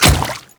Grineer Royal Guard Water Step, Footsteps

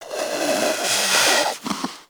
Predator Snore; Warframe; Hunting Critters; Characters

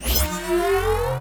Alt Charge, pistol; Crp BE Plinx; Blastor Pistol

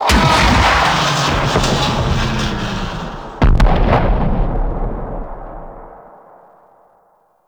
Tower Crash Stage Two Debris; Warframe; Teralyst; Ropalolyst

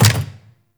APC Turret Dry Fire; Warframe; Lasrian Turret

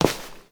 Step; Warframe; Koumei

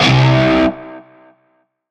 Shawzin Oct One C; Warframe; Narmer Shawzin

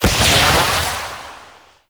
Aqua Board Jump Large; Warframe; Yareli

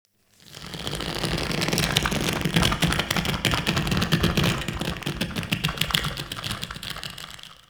Corpus Infested Tendon Stretch; Warframe; Ambience

